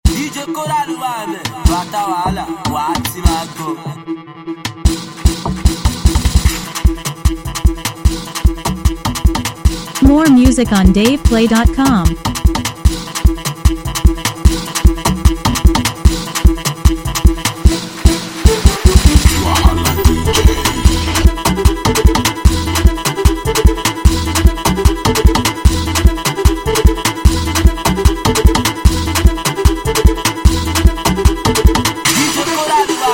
Free Beat

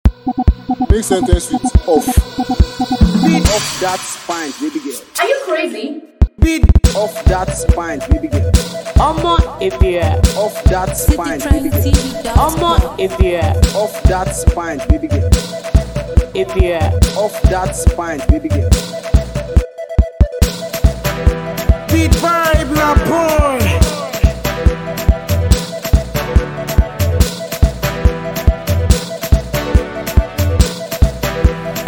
Beat

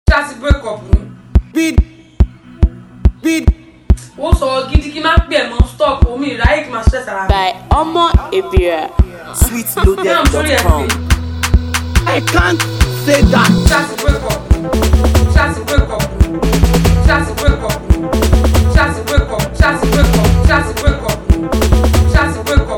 Cruise Beat

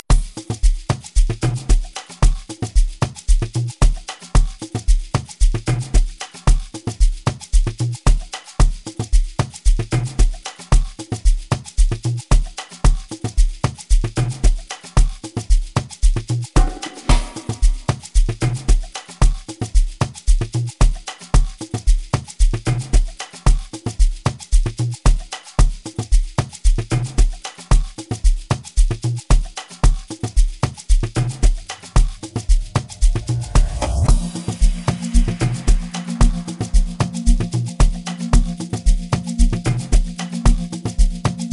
Instrumental Free Beat

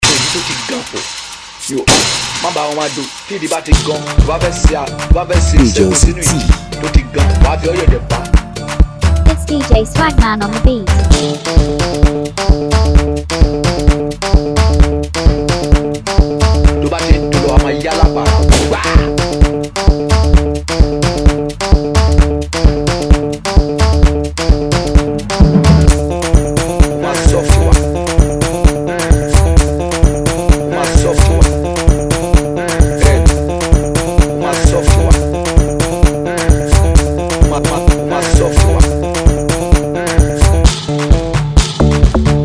Soft Cruise Beat

